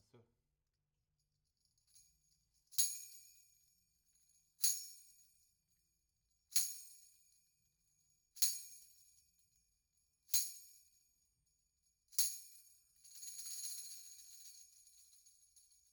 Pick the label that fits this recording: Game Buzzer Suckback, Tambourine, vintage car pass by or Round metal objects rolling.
Tambourine